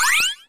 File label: appeal change